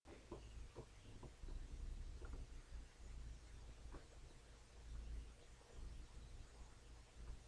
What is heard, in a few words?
piano